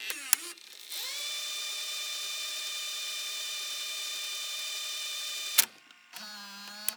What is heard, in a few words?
sfx tape rewind; sons of the forest